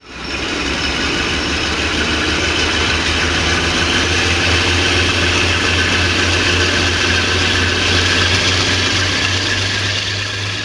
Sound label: class 52; simutrans; Pak128.Britain-Ex